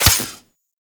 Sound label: Melee Dagger Impact Robotic Flesh; Weapons; Impacts; Daggers